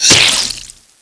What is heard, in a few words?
Bloat Hit Player; Bloat Santa